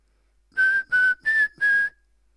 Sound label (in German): Pfiff